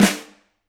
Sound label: Flam